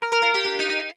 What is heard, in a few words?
mail